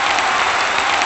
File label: APPLAUSE; Ensoniq ZR-76